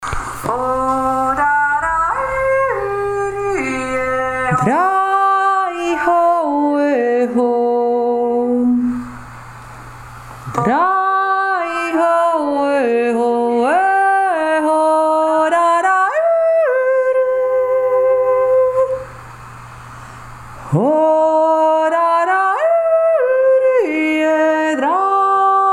schaflahter 2 stimme

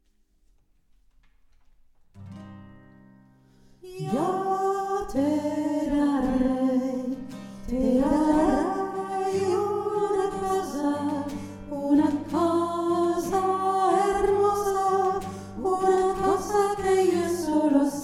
hauptstimme

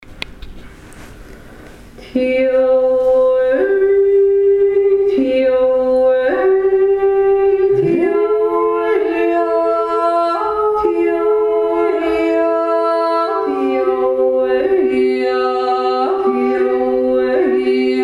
rohrenbohrer 2stimmig

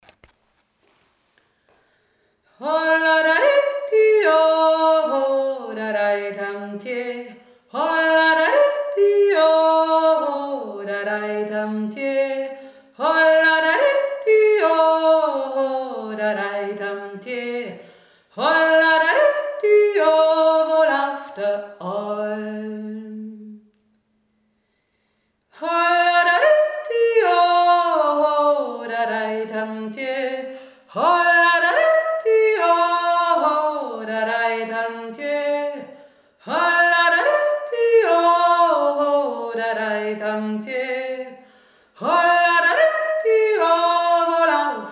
echojodler; admonter

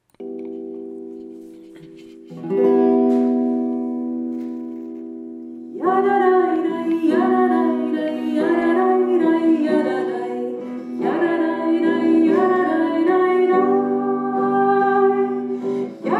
nigum zweistimmig

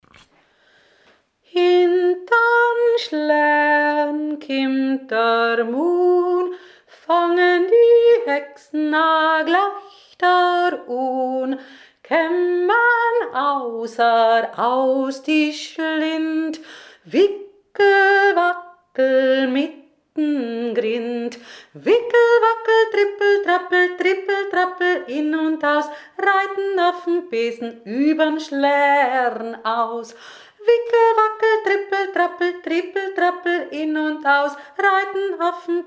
langsam; hintern schlern

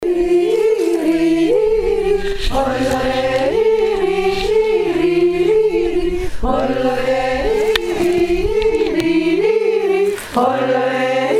der dirndljodler

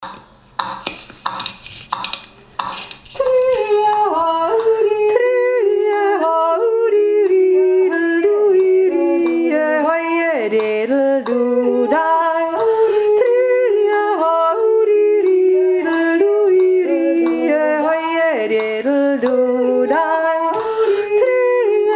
verjodlet kanon

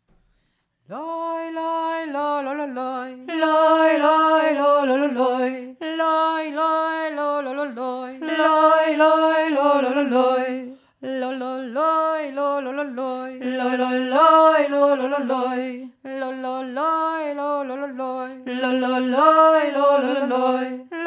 iranischer gesang der frauen am reisfeld

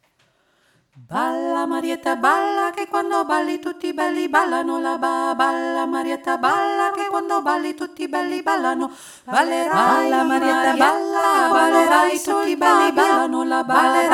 marietta vierstimmig kanon

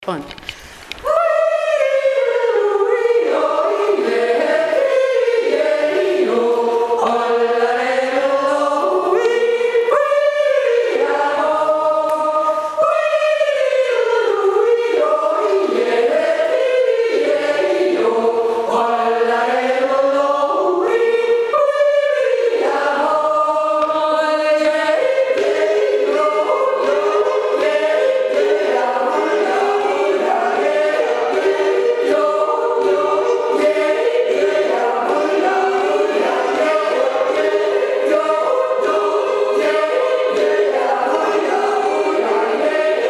werkstattjodel; wien

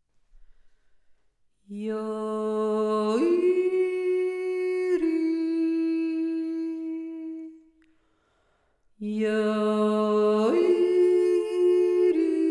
der oanfosche stade erste stimme, jodeln; drinnen und draussen jodeln im waldviertel 2024

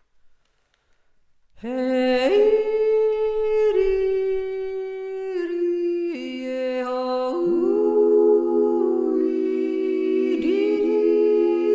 sunntigsjodler, jodeln im waldviertel; drinnen und draussen jodeln im waldviertel 2024

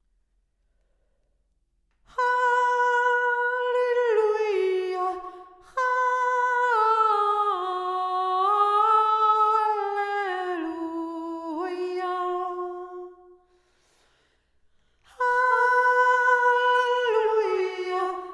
halleluja

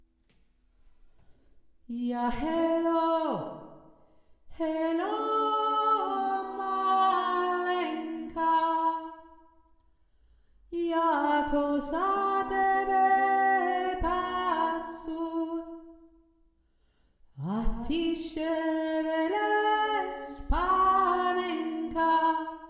hohe stimme; Ja Helo alter slovakischer Bergruf

